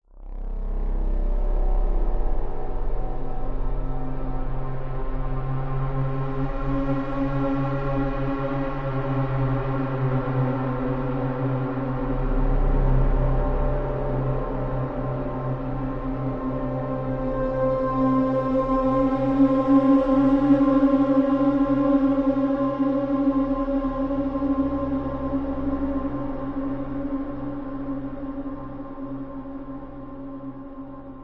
musical swell tense synth drama

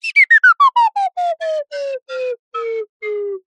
cartoon slide whistle descend stutter slow

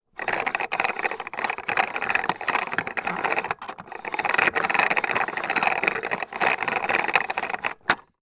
toy plastic cogs turn

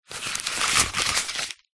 leisure board game money notes bills handling; monopoly

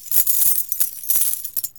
Coins Pouring; little robot sound factory

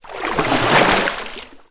transport boat paddle water single

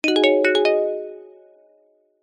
multimedia alert notification glassy plucked zen

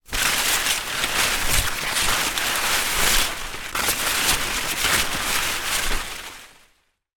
tree leaves movement fig tree; foley

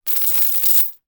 industrial chain med drop on ground; zapsplat

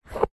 jenga wood block single slide out from stack; leisure game

